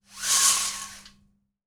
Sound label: BLIND; AKAI S6000 CD-ROM - Volume 6; Daily Life